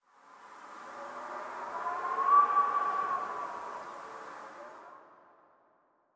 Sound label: howling wind